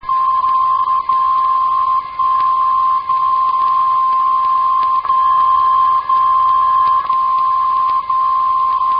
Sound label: dcf77, funkuhr